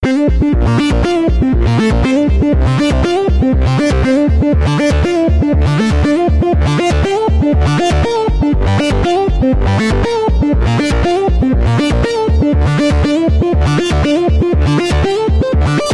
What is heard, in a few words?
Fuzzy Tone